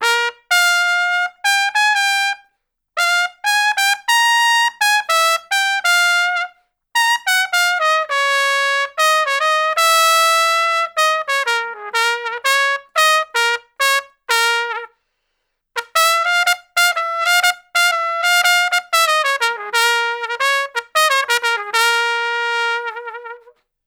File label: Trumpet Solo, Jazz Traditional; Sonic Foundry (Sony Creative Software); Jazz Solos and Sections